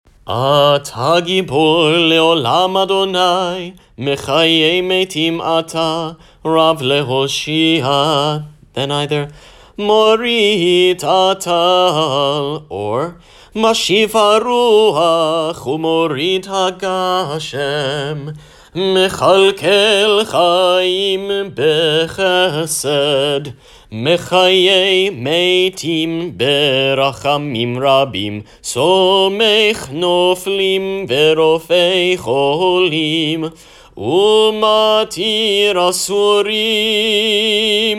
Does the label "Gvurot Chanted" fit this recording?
Yes